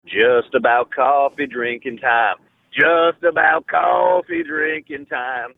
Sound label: singing just about coffee drinking time